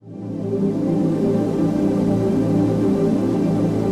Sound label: 122 BPM Pads Cmaj Amin Tonic A, ambient synth pads